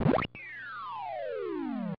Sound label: Death